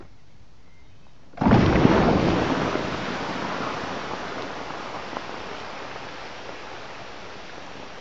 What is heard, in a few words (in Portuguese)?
Audio Mineracao subterranea